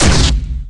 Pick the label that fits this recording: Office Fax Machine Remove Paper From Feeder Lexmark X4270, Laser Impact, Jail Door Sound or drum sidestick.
Laser Impact